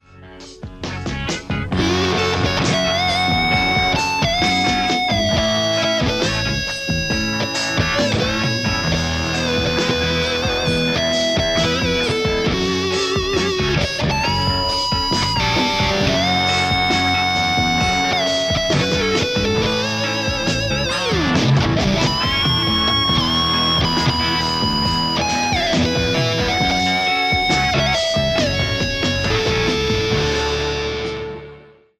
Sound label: Les Paul; ik rockerverb50